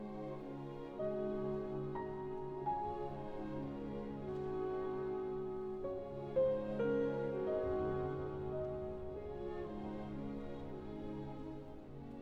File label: Piano Concerto in B Flat Minor; Andantino Semplice; Prestissimo; Tempo I